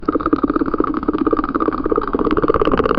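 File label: shuriken flying and spinning; japan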